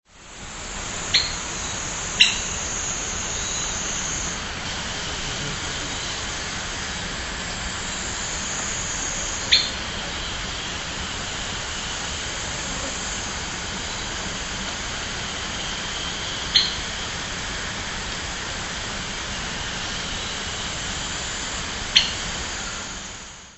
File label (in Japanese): nogutigera call; yanbaru